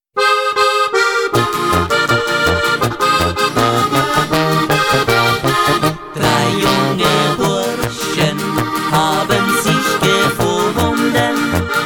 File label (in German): Polka